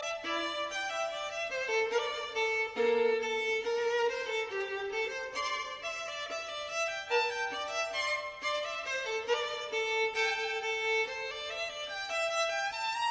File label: Piano Trio Rhapsody Dance Song; Harrison; Mirecourt Trio